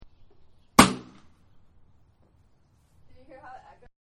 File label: reverberation; larkin